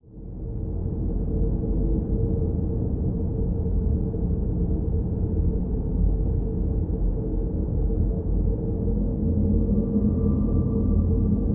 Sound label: Air Danger Base Loop; Scraprun; Deathrun